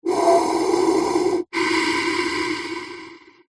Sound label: human breathing firefighter mask pov single inhale exhale